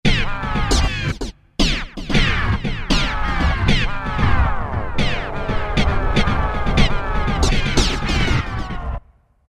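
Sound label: turn off reverse; Roland SRX-05 Supreme Dance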